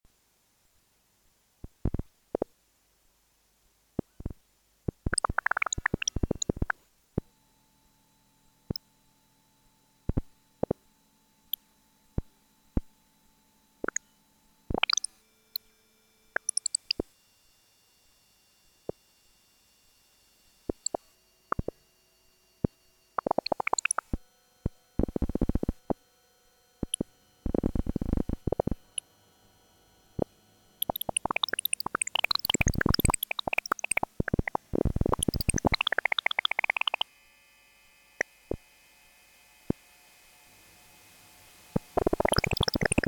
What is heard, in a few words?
ambience